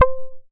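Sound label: pluck C, ARP 2600; Polyend; Simulacrum Sounds; 1 Shots; Synths; Keys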